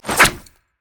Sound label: pick a card red card; LoL; twistedfate